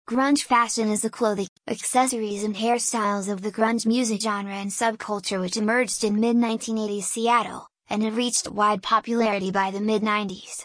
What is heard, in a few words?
Grunge